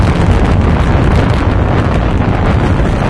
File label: rpg rocket loop, weapons; svencoop